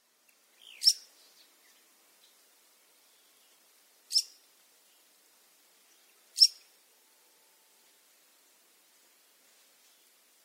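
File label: Taiwan bulbul, yonaguni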